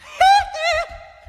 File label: hoo hoo SUNG; ooh ahh; ho hoo woo